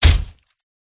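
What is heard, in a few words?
werewolf attack contact up; oboroten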